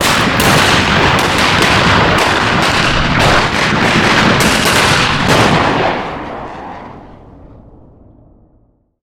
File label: continuous explosions